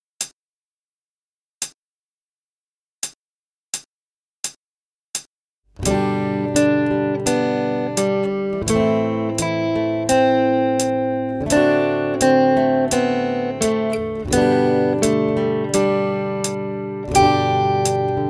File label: 3 Strings fast, guitar